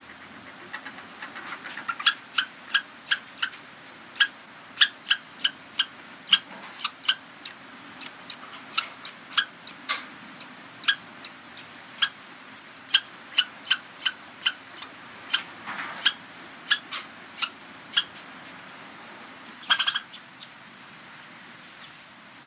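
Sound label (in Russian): болтовня белок классический sfx; jivotnyie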